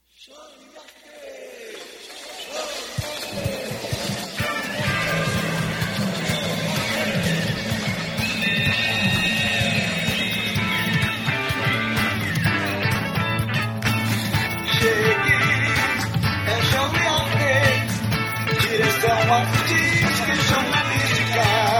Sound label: jingles